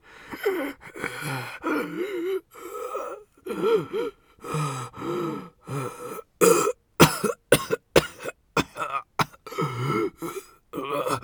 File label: breath death; Escape from Tarkov; scav5